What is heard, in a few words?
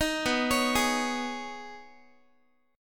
B 6th Add 9th B Guitar, chord; B6add9; arpeggiated; 18,19,16,18,x,x